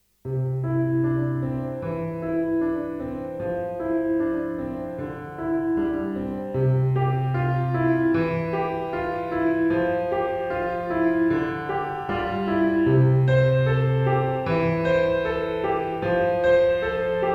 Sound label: piano pop